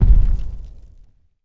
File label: garg step; slender